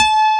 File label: CP70 C4